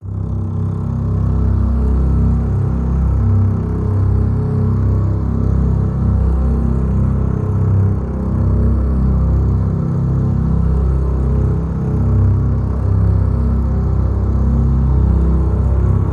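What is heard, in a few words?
drone rosin C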